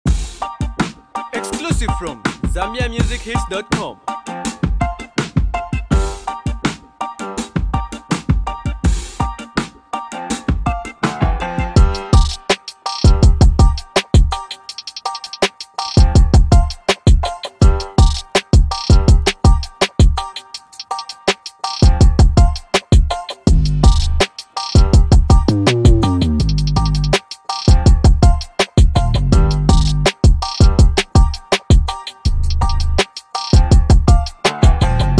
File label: My hood beat Challenge instrumental, Zambia & Malawian Music